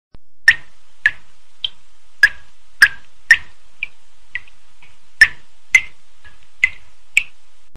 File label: Water Drops